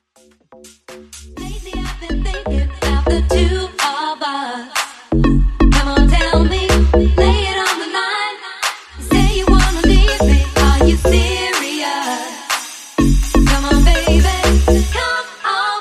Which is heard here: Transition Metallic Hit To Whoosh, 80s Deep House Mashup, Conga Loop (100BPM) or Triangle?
80s Deep House Mashup